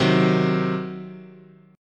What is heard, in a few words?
C Diminished, guitar chord